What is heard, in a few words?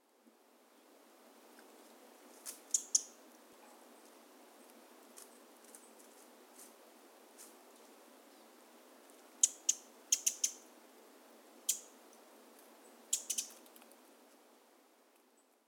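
Eurasian Wren; misosazai; hichibu; koe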